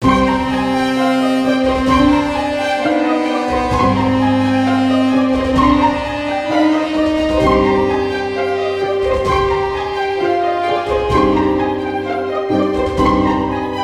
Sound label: Intense